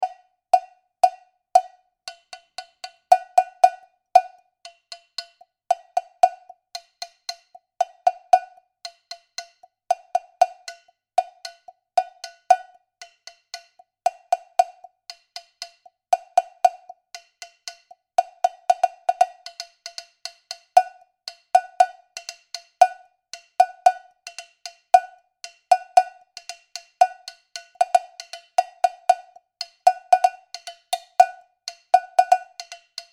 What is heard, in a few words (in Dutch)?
Cowbell klanken